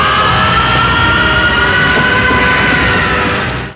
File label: scream; vegeta